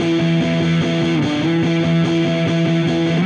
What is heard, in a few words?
Dirty Chunk Guitar, Indie Rock